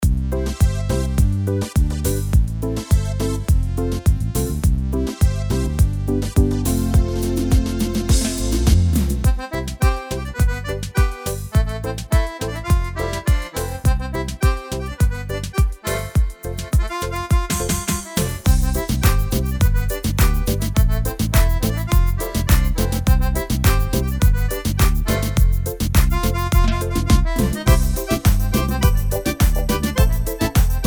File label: Kuduro; AiR Symphony